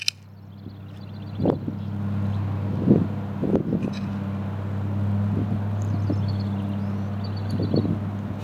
unknown bird sound

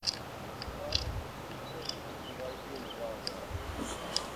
at lake

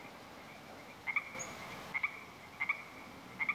pint sound

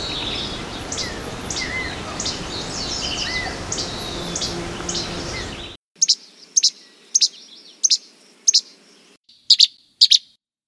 least flycatcher including comparison with gray

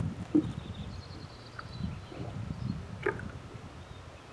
at lake

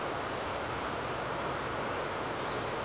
macgillivrays warbler

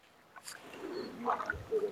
at lake

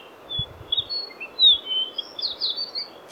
at marsh

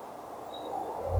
night bird

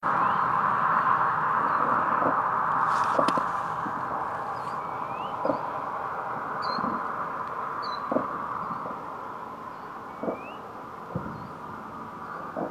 bird at night

